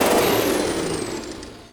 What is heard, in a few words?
assault cannon wind down; weapons